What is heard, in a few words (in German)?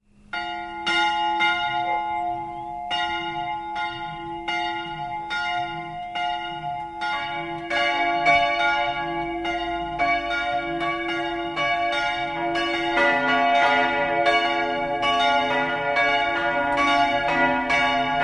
glocken; Lupburg